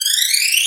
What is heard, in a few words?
Sci Fi Alarm Loop